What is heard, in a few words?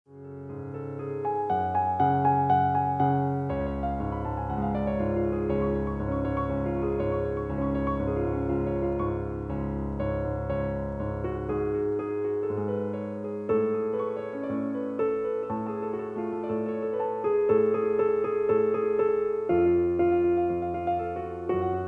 Piano